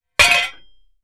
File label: Metal; TOOLKIT vol.2; DET24 ElementalMysteries